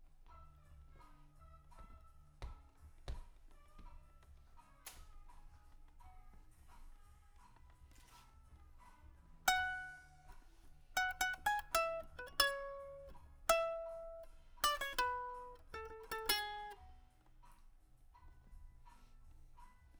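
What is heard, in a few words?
guitar Mic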